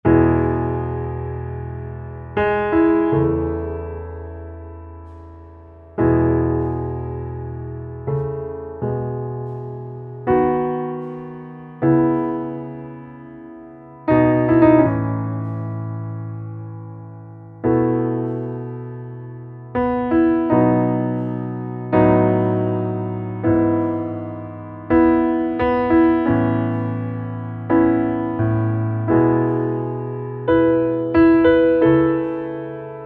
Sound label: Underscore PIANO; Worship Flow